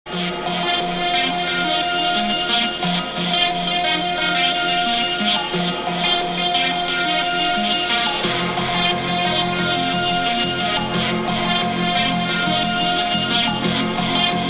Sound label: Drum and Bass Jungle Old Skool; UK Bass Radio; LIVE FORM CARDIFF